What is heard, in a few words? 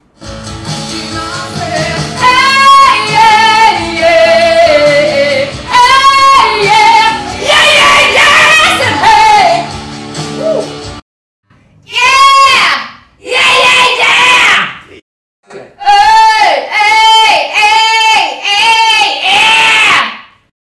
Distortion